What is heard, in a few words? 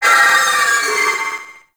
Vocal Death; Warframe; Sentients; Sentient Trooper